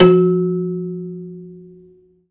Rubbery Piano, prepared piano; Total Piano; Rubbery Piano 2 -ped

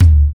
EQ Perc, Percussion Mix

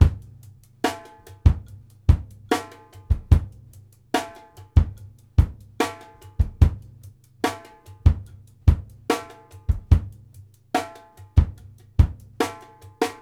FUNK KICK A, 72; Sampleheads; New York City Drumworks VOL-1

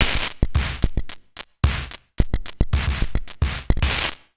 Bent Korg MR-16 (110BPM), cyborg beats; musicradar

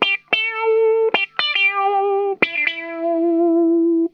PLEEEZ, Funk Guitar; 90 s Sample CDs; Zero G

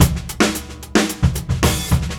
DRUM 110

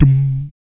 VOC PERC, BASS PERC

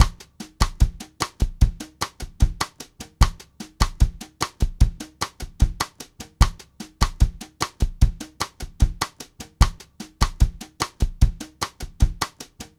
Bassa Nova, Latin Percussion, Drumset; Sonic Foundry (Sony Creative Software); Joe Vitale Latin Percussion

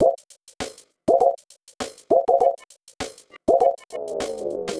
Robo Beat 100BPM, sci-fi Beats

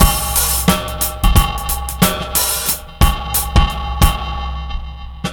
drm 090, Breakbeat, 090bpm; Synthi Strut

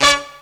HORN LICK, Hits & Brass

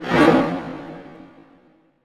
Orchestral Loops STRING ACCENT

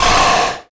CYBER KIT, DRUMS

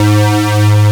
BASS, SYN BS; AKAI S6000 CD-ROM - Volume 1; SAMPLE WAVE MIXING 1

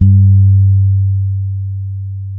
G 3, ragga, bass; Spectrasonics; Bass Legends CD 1

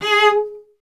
STR VC G#1, Cello; Miroslav Vitous; Solo Instruments VOL-1

